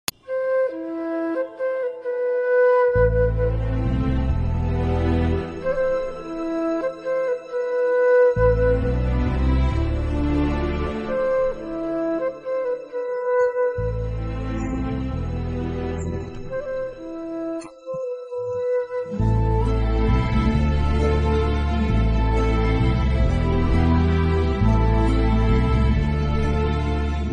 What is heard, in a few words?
climax sad bgm; dhanush; sunpictures; mithran r jawahar